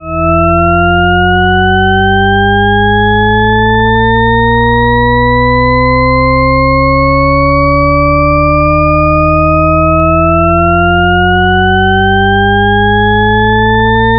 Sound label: shepard tone